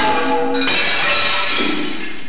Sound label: glass